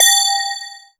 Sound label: BELL-GONG, A#; Big Fish Audio